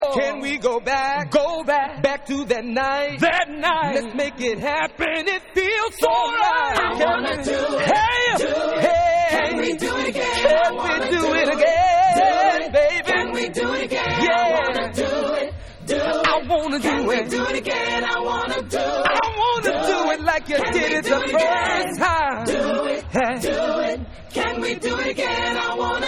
Acapella

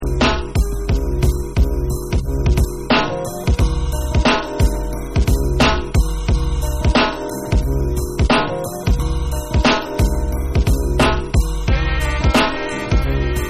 Remix Instrumental

